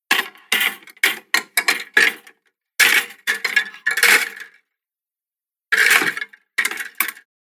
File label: Wardrobe Noise; Bedroom Sound Effects; Household; Andrew Fleming